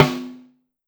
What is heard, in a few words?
Snare, Pitched Down; Clavia DDrum-AT